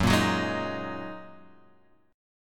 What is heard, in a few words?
A sharp 7th Suspended 4th Sharp 5th F sharp